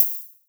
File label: HH, Dance Drums